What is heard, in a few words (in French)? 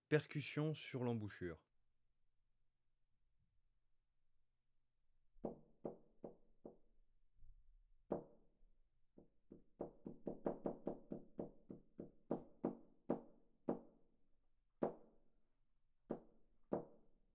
percussions sur l'embouchure, trombone; Effets; traite orchestration